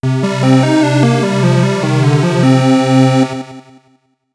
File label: Retro Defeat; Retro Victory, Defeat and Briefing (Mixed Systems)